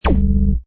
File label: Hi Tech Panel Servo Button